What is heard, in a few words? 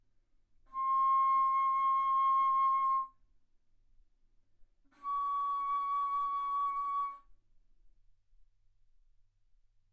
Bass Flute ff C6 Db6